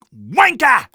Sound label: taunt exert; Sniper